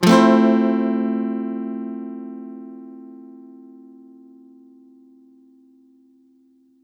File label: Guitar 128 BPM Dmin, One-Shots; Ghosthack Advent Calendar 2021 - Day 10; Guitar Loops and One-Shots